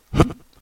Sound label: jump